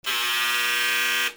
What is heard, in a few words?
BUZZERS